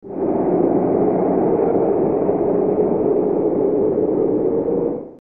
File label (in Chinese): 电视包装音乐